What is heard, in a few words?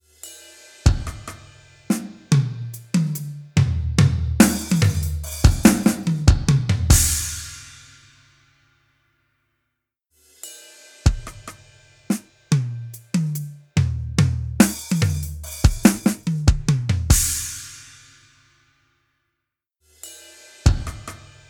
Drums, Wooden Room; Temperance Lite